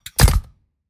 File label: footsteps robodog layer; Robotic Lifeforms 2; soundmorph